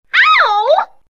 female started awe

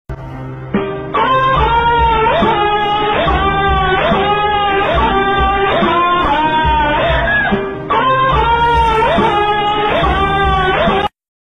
sad song

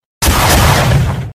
double hit

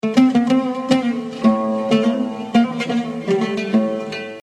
sitar arab

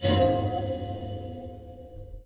chime; disco elysium

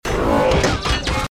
transformation sound

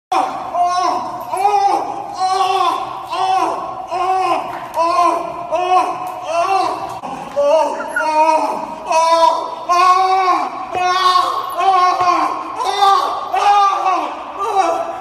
exercising with elastic band

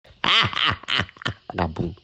hua ha ha

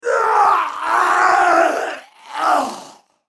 death; mk9 scorpion

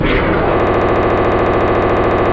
jumpscare sound; the story of ripped

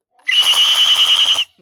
screaming boy

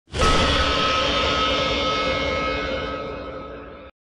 old candy black scream; fnac

